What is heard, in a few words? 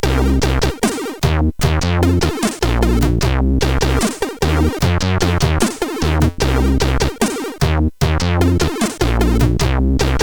Jazz Tune, CSG8580; CSG8580R5; sid